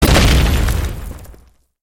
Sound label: ground attack; slender